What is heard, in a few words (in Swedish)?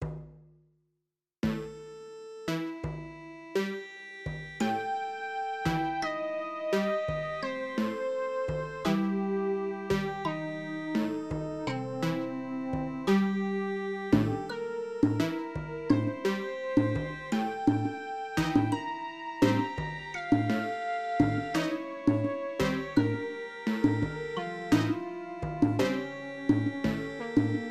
liten ters kvint; oktav; liters ters kvint